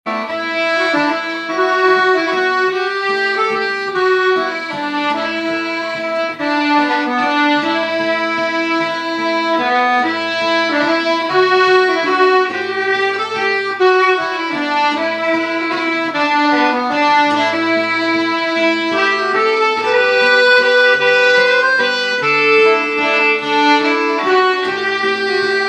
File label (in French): Valse